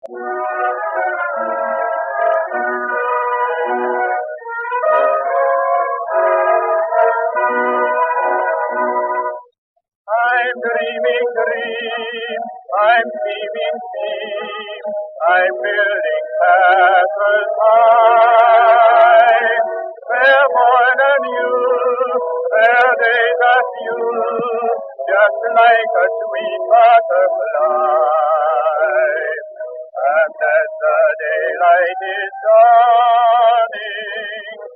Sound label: record